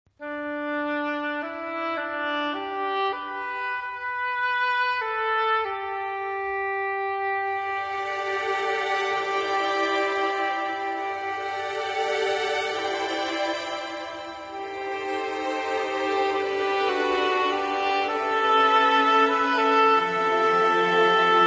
Orchestra

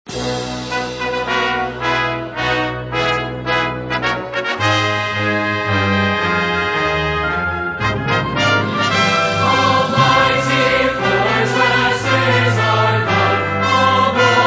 Singers And Orchestra

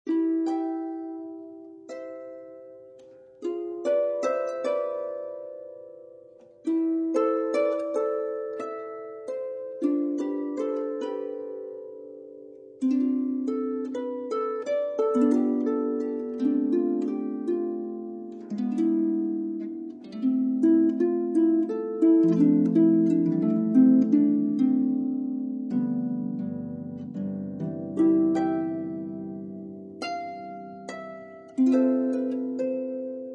It Is Well With My Soul, Harp Hymns

